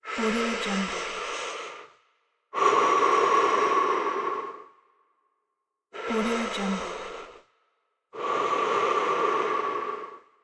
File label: male deep breath